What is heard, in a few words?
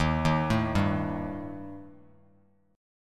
D sharp Major Flat 5th Bass Standard; D#Mb5 arpeggiated; 11,6,7,0; JGuitar